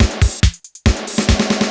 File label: fill 140